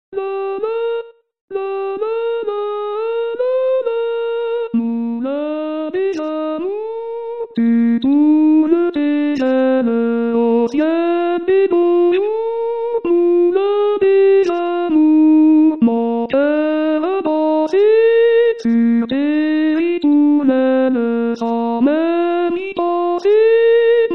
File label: Soprano staff; Harmonisation d'Alain Langrée